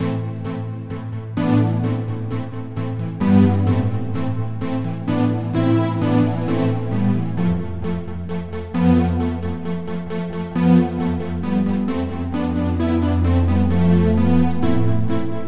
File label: Synth Piano; KORG OASYS; oasys assault